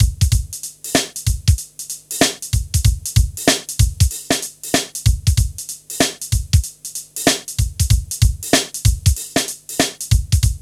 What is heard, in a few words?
HIPHOP; INIS; Funky Xtreams